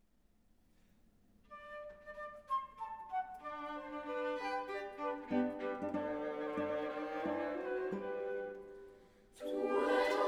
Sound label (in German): Tuet eilends erwachen (Arr. for Choir & Chamber Ensemble); Sacred Choral Music